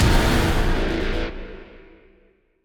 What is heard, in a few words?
Tiny End Combat Sting; Void Bastards